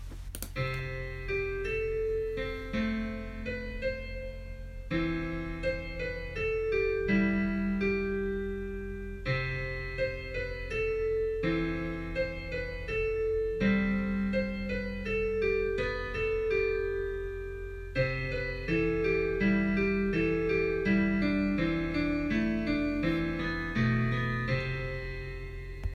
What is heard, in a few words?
INSTRUMENTAL